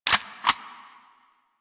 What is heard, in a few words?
Bronco Fire Mech 2D; Warframe; Weapons